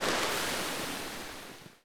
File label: Reel spin; netent